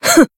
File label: Voice damaged, jp; minalca